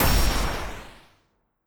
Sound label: Skel Drake Spectral